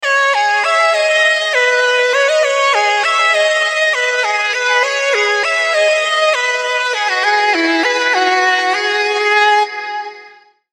C#m 100, Synthwave Synth-Pop MIDI Melody; Unison Synthwave & Synth-Pop MIDI Melody Collection; Crush

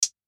Closed Hat; Unison Beatmaker Blueprint

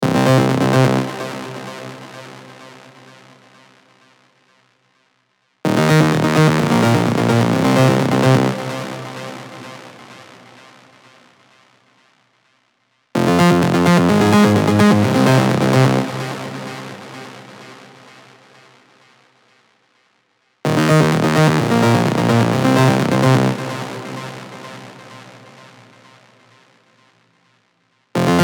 Synth Loop Cm; Unison Artist Series; Victor Ruiz "Power Pack" Vol. 1

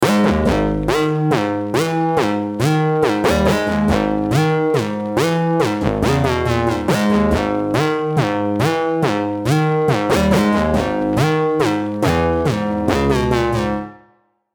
Lead; Empire; Unison Ice'd Out Collection for Omnisphere

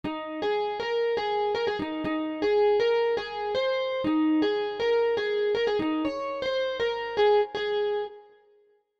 Cafe Ab 100, Folk MIDI Melody

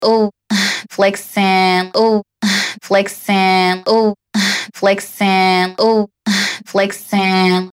VOX LOOP 125BPM; Volac

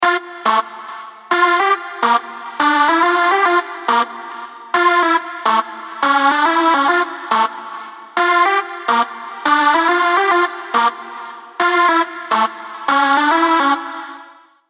Dm 140, Hardstyle Hardcore MIDI Melody; Unison Hardstyle & Hardcore MIDI Melody Collection

